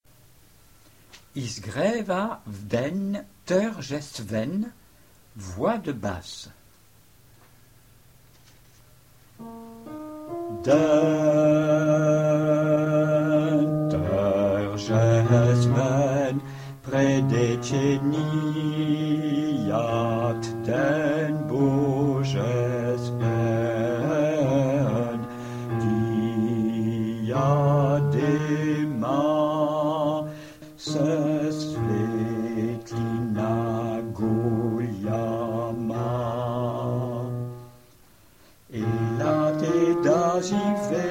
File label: Basse, chants; SATB; Izgreva den tarjestven; Peter Deunov; concert du 15 août 2009